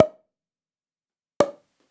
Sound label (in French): Bouchon de champagne; FOODGware